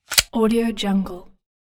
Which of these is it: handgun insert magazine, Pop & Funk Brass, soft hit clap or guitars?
handgun insert magazine